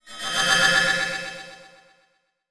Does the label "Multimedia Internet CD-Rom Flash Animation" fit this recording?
Yes